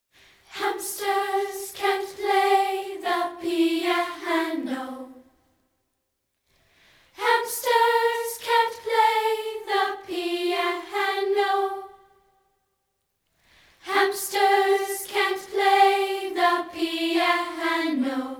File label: Hamsters A Cap W Drum Fill